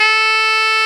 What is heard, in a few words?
SAX TENOR F, SAX Tenor ff